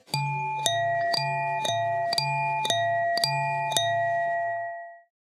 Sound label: sms cymbals; Mudita Pure